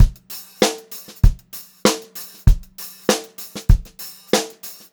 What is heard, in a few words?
FUNKY BEAT, Funk-Groove Drumloops; USB Soundscan vol.38; AKAI